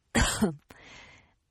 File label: Cough Female; Sickness Sounds; Human